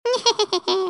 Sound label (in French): Hi hi hi hé, Cartoon; bruitage